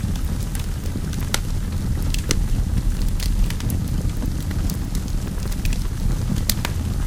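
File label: fire loop, ambience; video games; source engine; portal2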